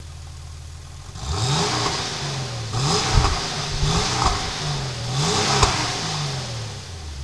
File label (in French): 993, Porsche, Démarrage; Accélération